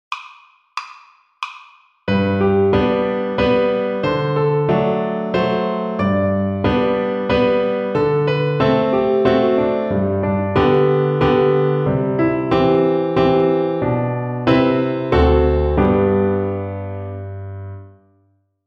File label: String Gym, Piano Accompaniments; Etude 23 - Teams of Three on the Scale of G